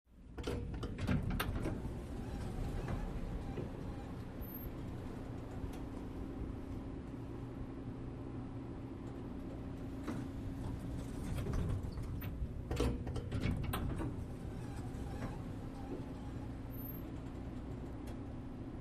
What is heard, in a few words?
Elevator Door Inte